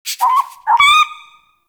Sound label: Rabbit Ambient Call; Warframe; Duviri